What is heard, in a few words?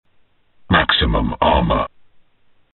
suit